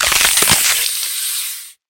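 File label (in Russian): бросок ядовитой змеи на жертву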